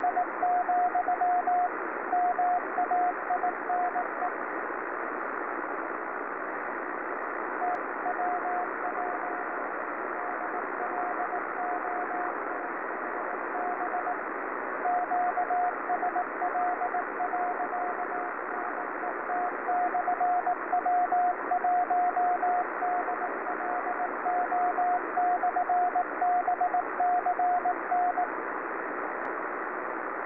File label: beacon; w1dlo; kb3mxm